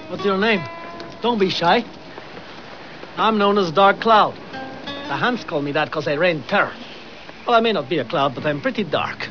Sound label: DC 11kHz